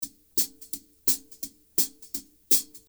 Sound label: swing hats; Jazz drums